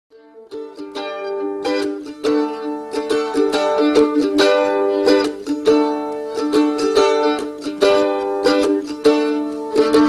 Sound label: Mandolin Moan